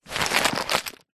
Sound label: batteries aa many pick up scoop from carpet; foley; zapsplat